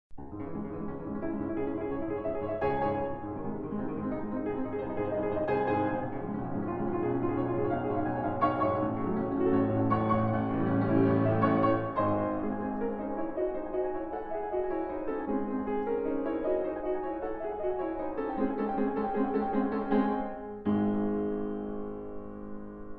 Beethoven Sonata 14 c-sharp minor Moonlight presto agitato